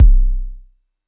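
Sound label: vermona drm1 mk3 bass drum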